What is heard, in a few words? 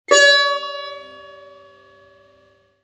tar re